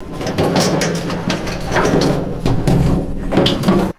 heavy body falling through metal scaffolding

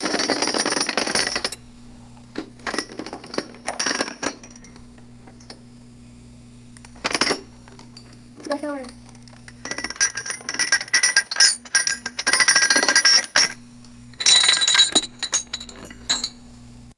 multiple glasses rattling and clinking together

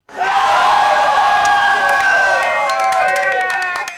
small rowdy crowd cheering and clapping; crowds

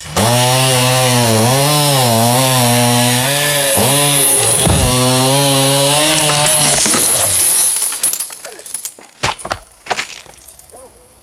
chainsaw cutting wood and limb falling

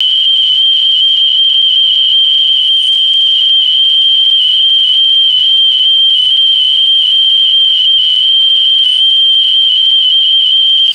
rapid high pitched electronic alarm beeps; alarms

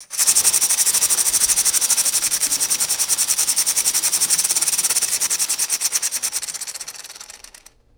toy pinwheel spinning and slowing down

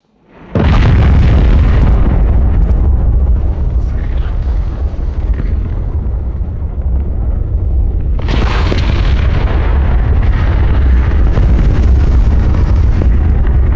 distant volcanic eruption rumble and roar; liquid mud

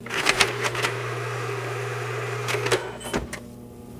fax machine whirring and beeping

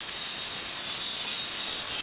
short magical negative ui chime

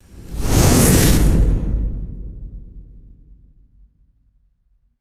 quick fiery magical whoosh

